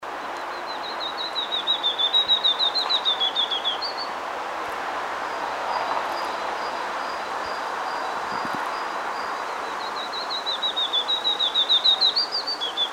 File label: phylus abnormal song